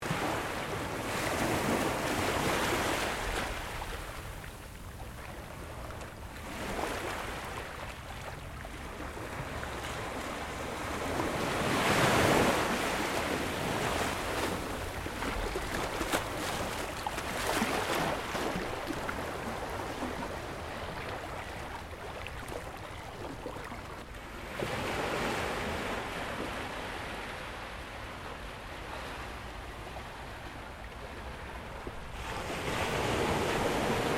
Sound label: Ambience Ocean Beach Waves Gentle Loop Stereo, Sunshine coastal area; Outdoors (Sound Effects:Backgrounds)